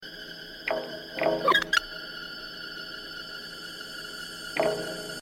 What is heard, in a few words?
pipe knocking